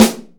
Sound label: tight lows snare sound a sharp key